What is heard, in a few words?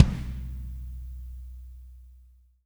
AMB KICK, KICKS; Spectrasonics; Burning Grooves; BG KICKS